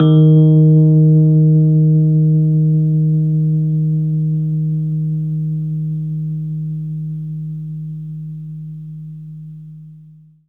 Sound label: Rhodes, D#; 3D Sound; 3D Keys VOL-1